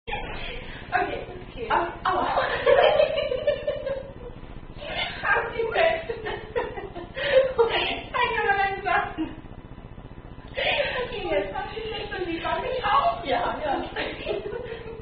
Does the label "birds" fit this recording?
No